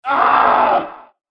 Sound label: scream